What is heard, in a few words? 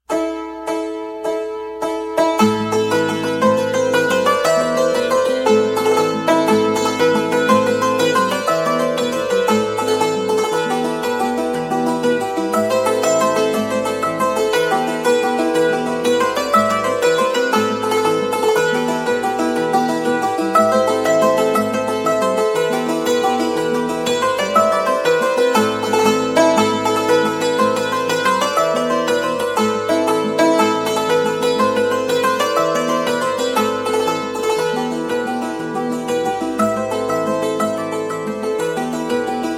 Dulcimer; Black Nag